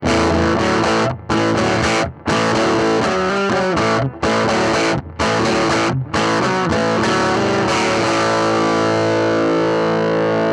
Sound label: champ600 6inch, fender champion 600; 6" Jensen MOD Speaker; The amp was played using my PRS SE ONE into input 1, guitar volume maxed, amp volume at 10